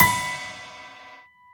soft hit whistle; osu!

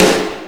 soft hitclap; osu!

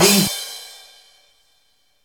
soft hitfinish; osu!

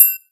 drum hit finish; osu!

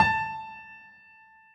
drum hitwhistle; osu!

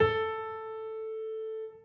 soft slider tick; osu!

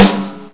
normal hitclap; osu!

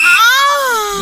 normal hitwhistle; osu!

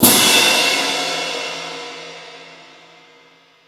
drum hit finish; osu!

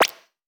pop sound; steam; steamui

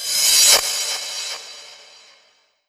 soft hit finish; osu!

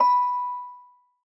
soft hit whistle; osu!

